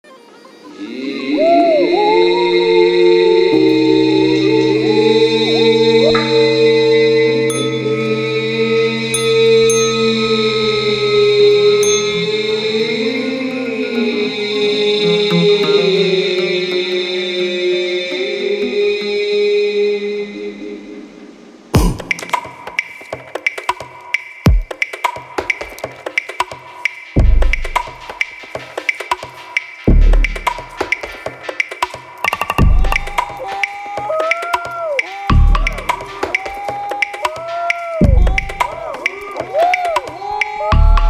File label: electric soul, 177bpm Gm Dm